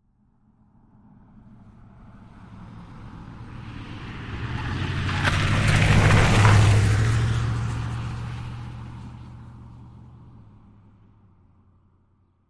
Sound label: Wagoneer: Fast Corner By, With Tire Skids, Jeep Wagoneer